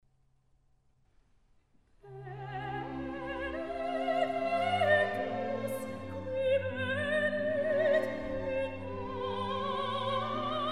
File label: Missa brevis in G Benedictus; Mozart; Missae and Requiem; Davis, Gardiner